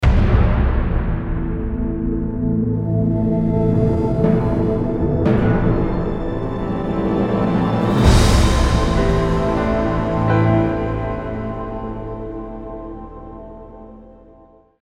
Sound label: Cinematic Logo Intro